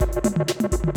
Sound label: ACID JAM